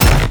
tiger punch

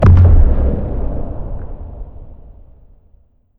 e skill gun ctrl

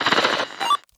radio call pre

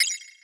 car crush

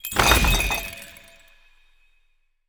ui sale interface

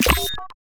ui interface load

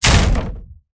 heavy object wood crack

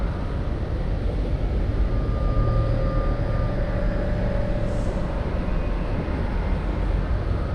furnace amb

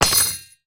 bell fall

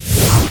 halberd

